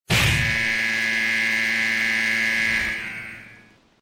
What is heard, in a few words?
stunned; killdroid